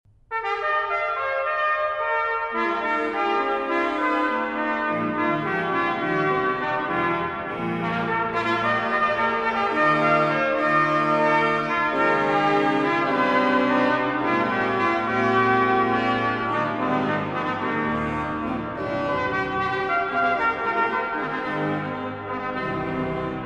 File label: Sonatina Organ; Petrich